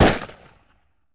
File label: Pistol Shot With Echo; Weapons & Firearms; Sony Pictures Sound Effects Series Volumes 1-10; Sony Creative Software